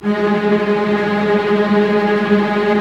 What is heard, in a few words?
STR, Roland LA Composer